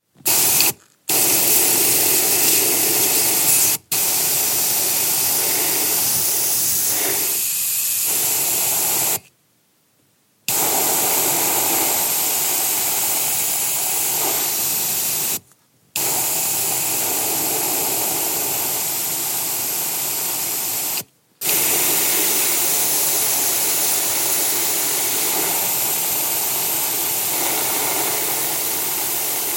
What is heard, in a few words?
spraycan